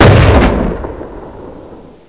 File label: blast, houndeye; svencoop